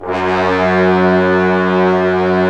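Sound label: brass trombones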